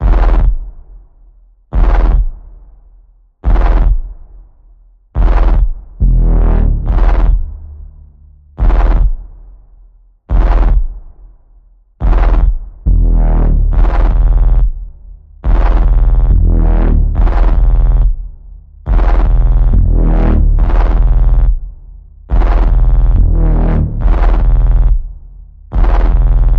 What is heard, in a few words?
Evil Warping Bass Pulse E, Key of E